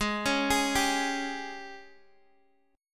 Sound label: G sharp Major 7th Suspended 4th G sharp, Guitar DADGAD